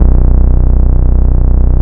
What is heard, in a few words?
DAMAGED BASS, Bass Analog & Synth; USB Soundscan vol.12; AKAI